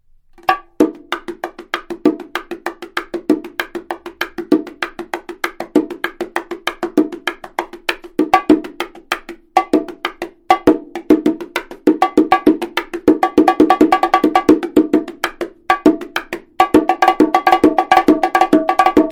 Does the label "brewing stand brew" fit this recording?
No